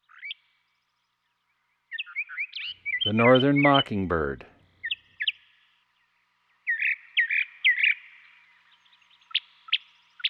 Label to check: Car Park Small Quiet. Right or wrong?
Wrong